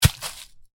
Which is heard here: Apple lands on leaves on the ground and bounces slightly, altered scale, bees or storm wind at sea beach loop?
Apple lands on leaves on the ground and bounces slightly